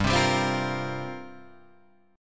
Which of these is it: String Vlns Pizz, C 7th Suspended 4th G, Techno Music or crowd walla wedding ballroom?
C 7th Suspended 4th G